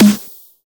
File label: Meaty snare